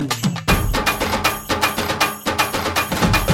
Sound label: folk beat sms